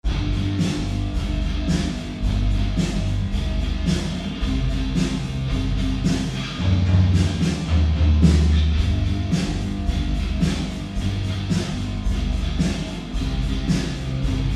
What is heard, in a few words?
Looperjam; Musikproduktion